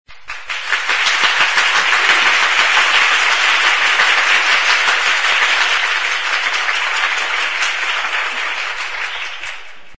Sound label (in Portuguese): som de aplausos